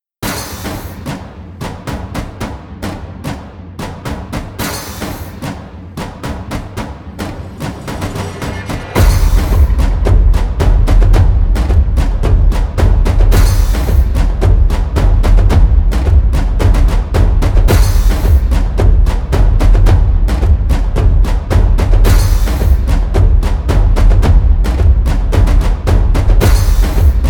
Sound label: Next Chapter Underscore, Percussive Drama; Narrative Mind